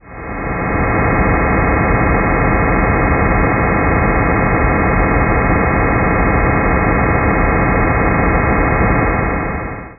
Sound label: noise mid